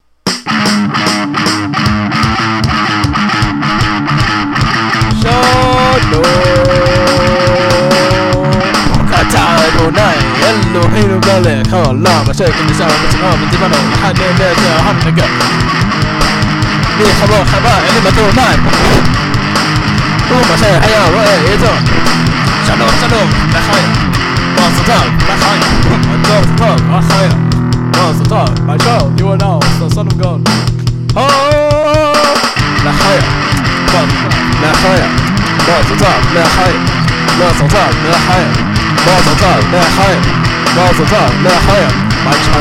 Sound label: Jewish Metal